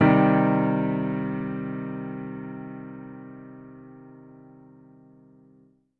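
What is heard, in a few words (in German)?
Moll Akkord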